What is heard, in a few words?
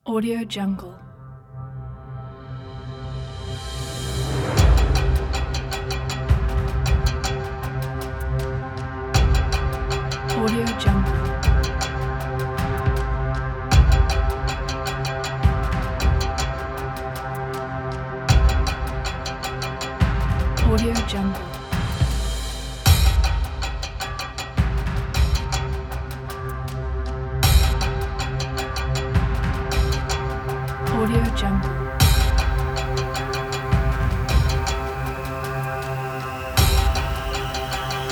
drums of war; epicmasters